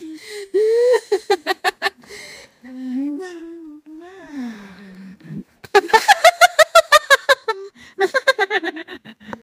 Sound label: women giggling, female